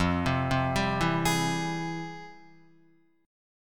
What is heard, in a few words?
F Minor Major 9th, Guitar, chord; FmM9 arpeggiated; JGuitar